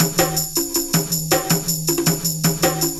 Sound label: Detroit Percussion, 4A, Jungle Breaks; Blu Mar Ten - Jungle Jungle - 1989 to 1999 Samplepack